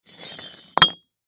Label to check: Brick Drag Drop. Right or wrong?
Right